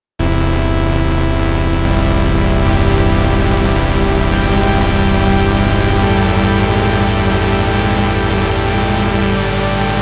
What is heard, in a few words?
cinematic tension swell sustained disson